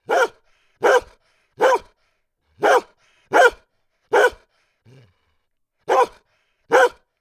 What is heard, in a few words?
dewey bark